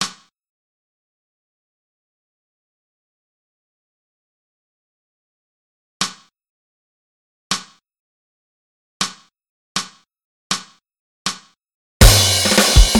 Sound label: Break caisse drums